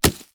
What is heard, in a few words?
Jump Down; Idle Champions of the Forgotten Realms